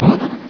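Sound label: teleport; Scorpion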